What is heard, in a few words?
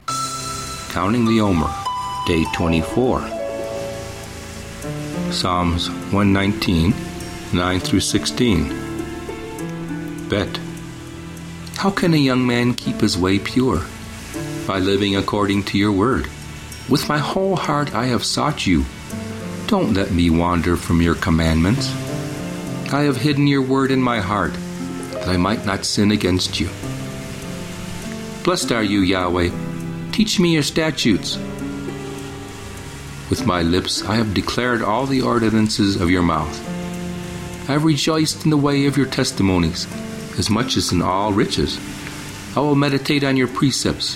Meditative w Waves Ocean; Omer; Psa119